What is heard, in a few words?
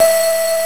PERC BOTTL; E-MU Formula 4000 Series Vol. 2